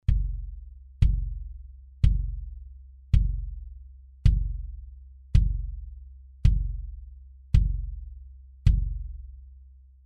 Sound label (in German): e902 aussen